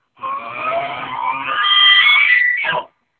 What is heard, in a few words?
bugle